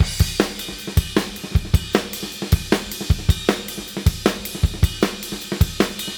Beat Loops, 155; Book em; Zero-G - Monster Beats

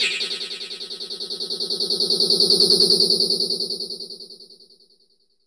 BOMERANG

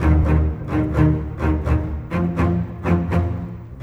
Bass Cello; Sonic Foundry (Sony Creative Software); Orchestral Series VOL-4; Rock and Pop; Rock-Pop 18

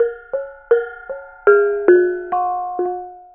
BONANG; Spectrasonics; Heart of Asia; BONANG PH

